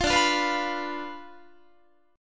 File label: D-Minor 6th, D, Guitar, 4A, 4E, 5A, 5D, 5G sharp, 6C sharp; strummed; 20,22,20,21,21,22